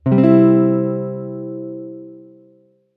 c major a shape, chord box, guitar

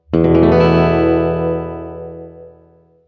e sus4, chord

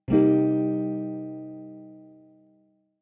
C min7

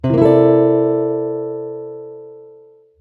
C dom13

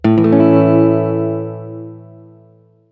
a major open, chord

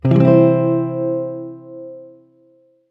c major g shape, guitar chord

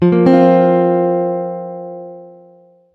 f sus4, chord box, guitar

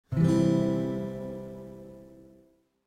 c major open

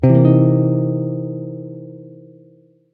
6 c dom7 s9, guitar chord

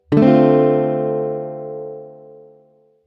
dmin7, guitar chord

